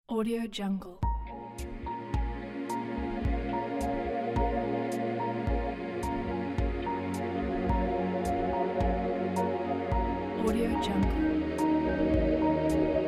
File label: ambient soundscape